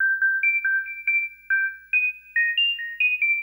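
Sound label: Trance, Techno Melody; TechnoMusicMaker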